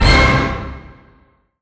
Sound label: Roland SC-88 Orchestra Hit